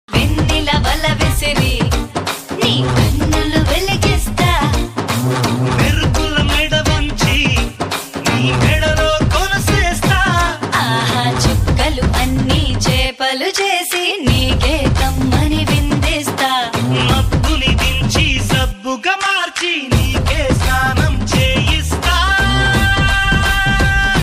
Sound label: telugu love songs